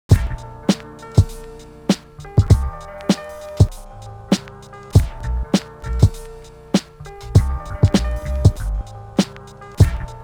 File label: PIANO